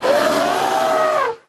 elephant

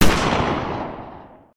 Pistol Monkey Shoot; gnarled forest

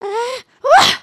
backflip cautious; emotes

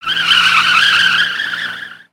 tire Screech

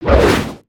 Long Whoosh; Valiant; aronwk collection; ndaudio

